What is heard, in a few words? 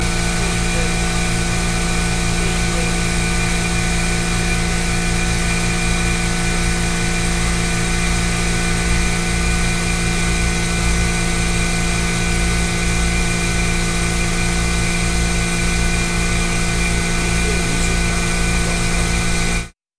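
Industrial Basement Noise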